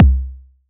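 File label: bass drum 80S; ModelCycles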